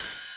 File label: Ride